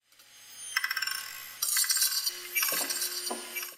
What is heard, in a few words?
hdd